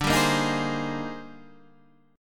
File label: Eb Major 13th D, guitar